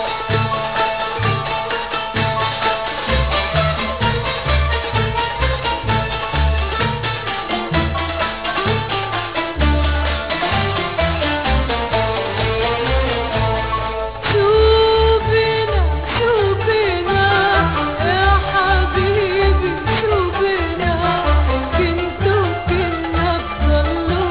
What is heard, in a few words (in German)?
gedudel; de arabgate